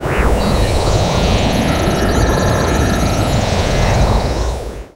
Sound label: sonification